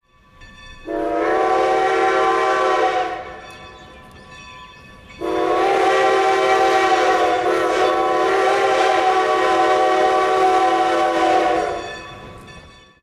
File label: steam train whistle